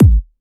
One-Shots Kick

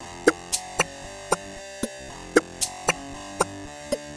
Pop House No Beat

